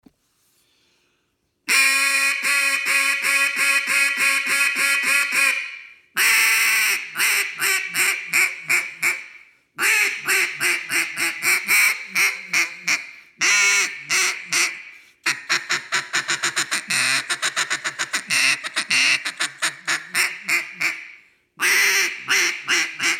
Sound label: IMPACT DELRIN